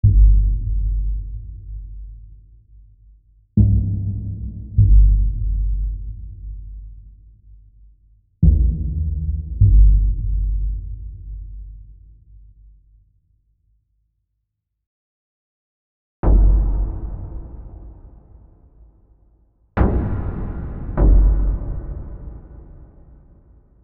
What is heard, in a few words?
Deep Drones